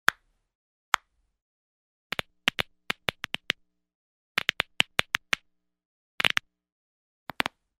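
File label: cracking fingers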